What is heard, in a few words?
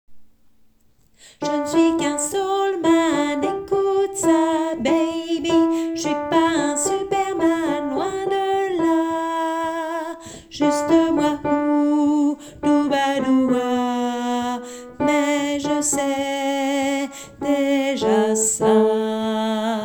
alto